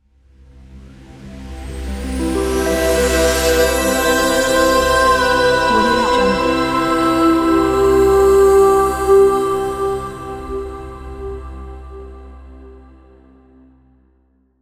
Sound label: intro hope logo; goldenantelope